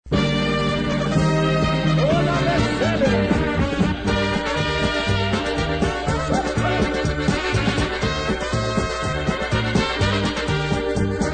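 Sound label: Polish wedding march instrumental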